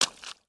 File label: Foot Swamp Water Run; footsteps